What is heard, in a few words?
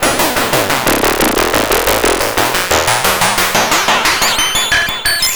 Serge, Synths Arpeggios; Electronic Point Blank